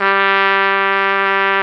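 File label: trumpet, harmon dry; Roland L-CDX-03 Disk 2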